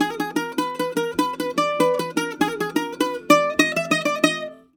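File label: G#, bouzouki; USB Soundscan vol.53; Mediteranean Traditions; AKAI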